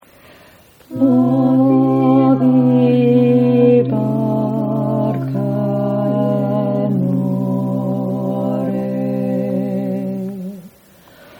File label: Tenor